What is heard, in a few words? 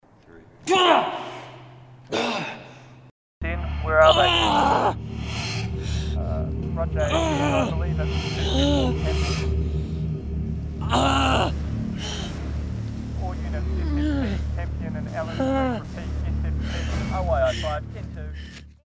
Voice Efforts